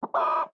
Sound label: ambient; World of Tanks